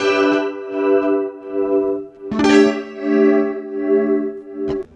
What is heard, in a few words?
CLEAN, CLEAN GTR